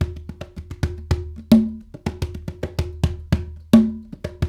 World Dance

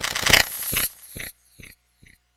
SPACE CLAP; E-MU Formula 4000 Series Vol. 3; Analog Odyssey

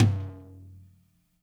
FLOOR TOM, ELECTRO KIT; DRUM N BASS; JUNGLE BEATS; KIT SAMPLES; MARIO BROS

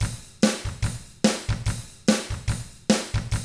ROCK, Double Platinum Drums

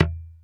E0 DEAD, STIK BASS; D-STIK BASS1

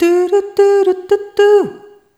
Rhythmic G

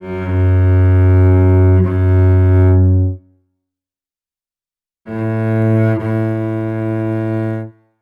Celtic Cello; Sonic Foundry (Sony Creative Software); Continental Drift Aftershocks

